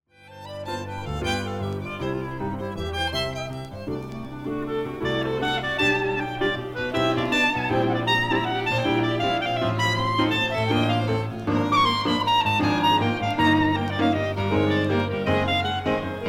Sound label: Hambo Cl Solo